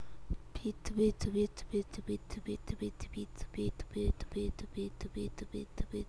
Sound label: beat